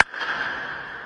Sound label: perfect dap up